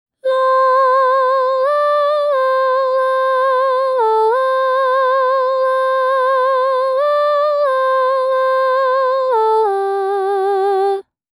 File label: Soprano